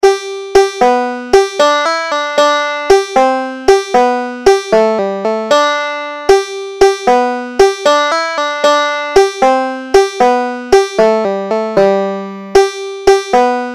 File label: larry ogaff, banjo